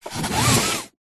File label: car seatbelt pull nissan patrol; vehicles